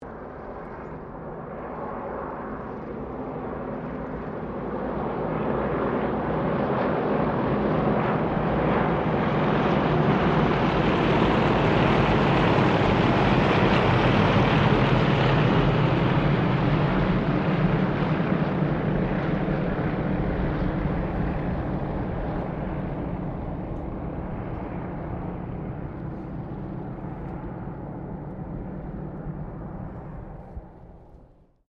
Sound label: 1977 YAKOVLEV YAK-52 SQUADRON PASS, Russian Yak 52 Training aircraft; Airplanes; Vehicles